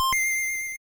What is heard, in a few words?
kidou, gameboy